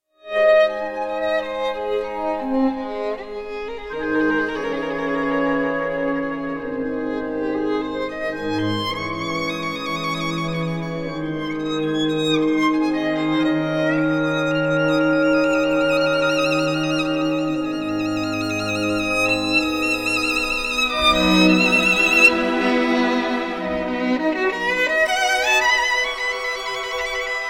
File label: fantasy for violin and orchestra; max bruch; scottish; op 98